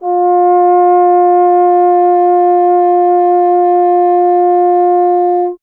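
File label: bass trombone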